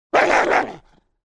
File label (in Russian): zlobnoe gavkane